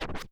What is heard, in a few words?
Small Cut Noise, vinyl record; Cuts Small